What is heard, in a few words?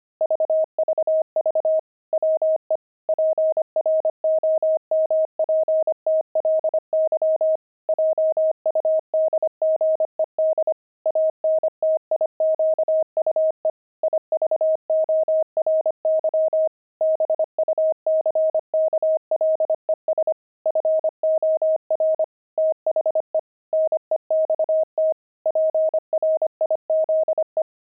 pangram, intermediate pangrams files